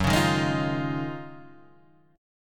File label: D sharp Minor 11th F sharp, chord